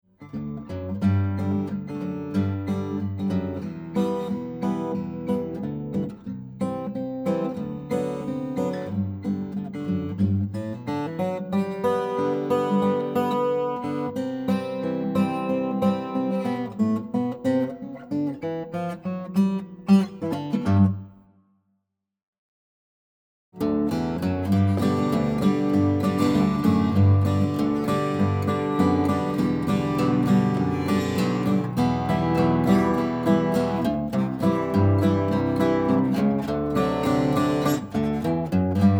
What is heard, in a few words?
12 str, guitars